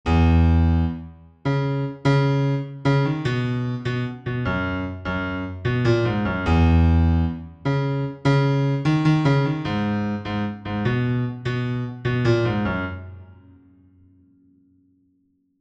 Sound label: Chorus bass